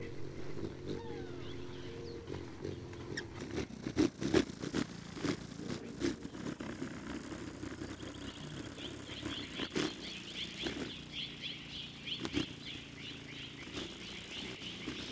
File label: Hummingbirds, Madera Canyon